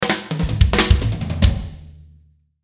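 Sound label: Musical Element, Drum Snare March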